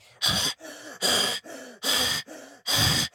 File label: Soul Master Stun loop